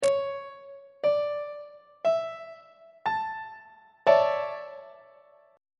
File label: e double flat major 7th suspended 2nd 3rd inversion on treble clef